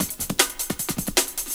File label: Jazz Note Break, Jungle; Breaks; Blu Mar Ten - Jungle Jungle - 1989 to 1999 Samplepack